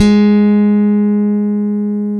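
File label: Chapman Stick, bass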